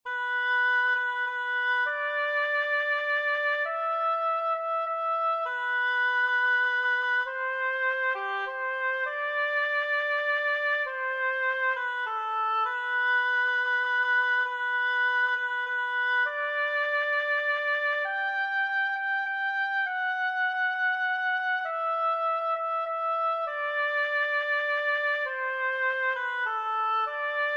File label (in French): Extrait mélodie du hautbois aide au chant; Symphony No. 8 in G Major, Op. 88, III. Allegretto Grazi